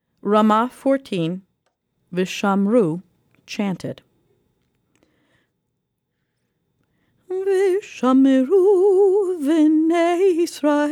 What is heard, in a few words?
vshamru chant